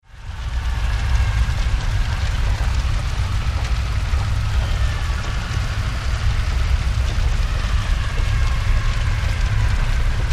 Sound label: Horror Hell Fire Voices Scream; Scary Soundscape Sound Effects; Scary Soundscapes (Sound Effects:Horror)